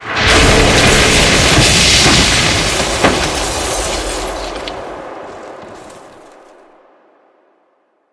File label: waterfall; wotlk; Doodad; frostwyrm